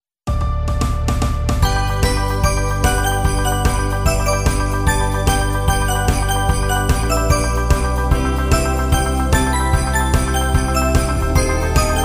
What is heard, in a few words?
melodie